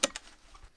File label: button